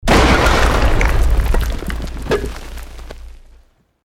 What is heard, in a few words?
EXPLOSION MEDIUM STONE ROCK